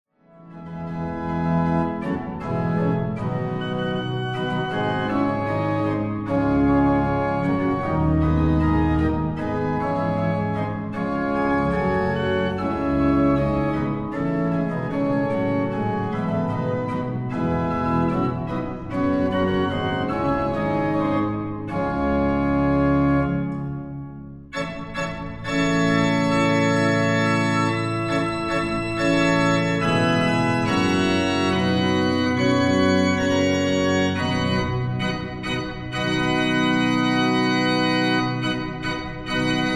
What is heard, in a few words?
canzona a 6 in d, orgelbau; kerll; niederehe